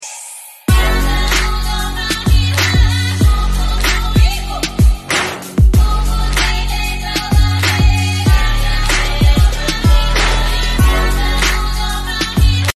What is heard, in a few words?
Hard Aggressive Choir Rap Beat Hip Hop Instrumental